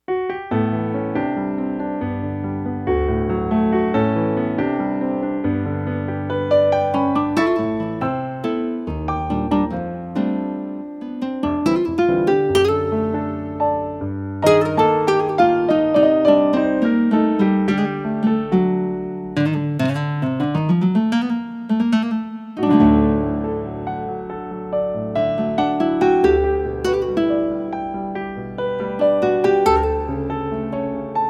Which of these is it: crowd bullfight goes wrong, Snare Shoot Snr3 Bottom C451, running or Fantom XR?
Fantom XR